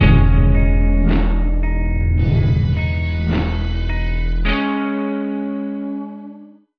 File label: Link Sting